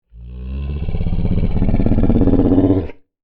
Monster Yeti Snarl, Horror, Monster, Yeti, Snarl, Creature; Monsters